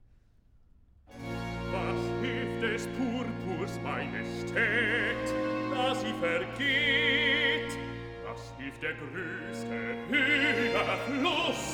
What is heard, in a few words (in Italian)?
Recitativo (Bass); Cantata, BWV 75 'Die Elenden sollen essen'; Bach; London; 1st Sun After Trinity